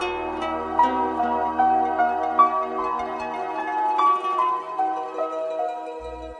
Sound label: piano, Sad